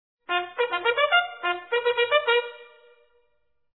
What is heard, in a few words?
Band, bugle calls